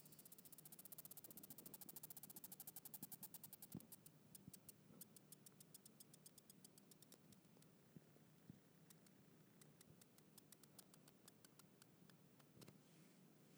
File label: lema daturaphila stridulation, insect sounds; Three-lined potato beetle (Lema daturaphila) being pursued by a spined soldier bug